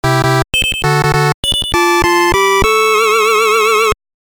Stage Complete 8-bit Version; ducktales remastered official game soundtrack 2014

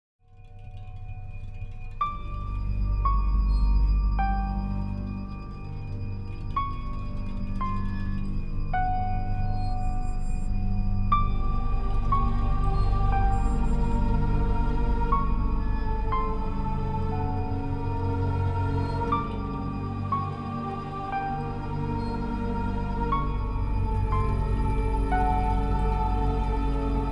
Intro music

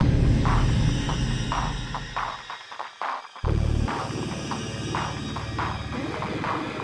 Low Groove Intro; Unreal II The Awakening; Acheron

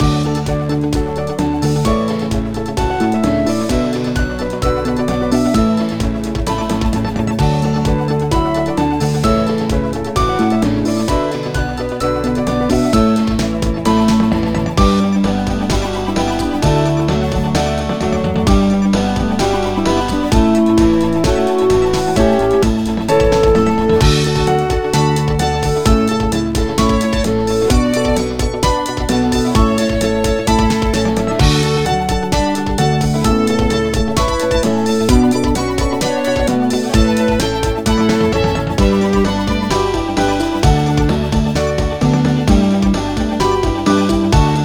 Chaotic Angry Sad; Imagine Sweet 16; NDS

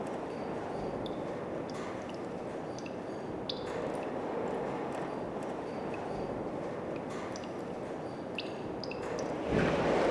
SFX loop; Disney's Dinosaur

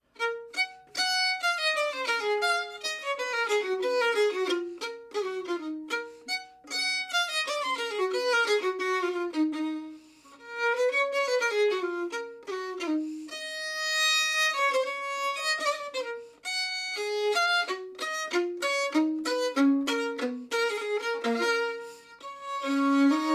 Violin; Assassin's Creed Syndicate

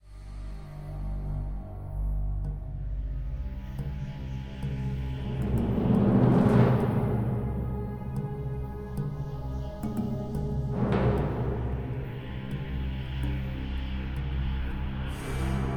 Fight Theme Ambient Fast Start; ryse son of rome 2014

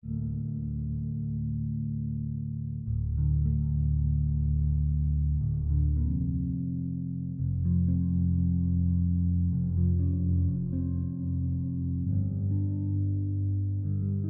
Journey Tension; No More Room In Hell; Windows

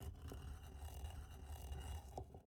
Plataform Moving

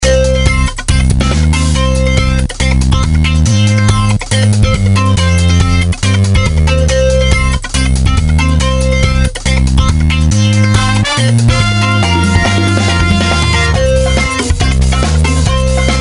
Music; Street Football II; NDS

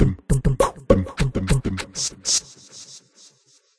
Jungle Ambience Beat Short; And Yet It Moves

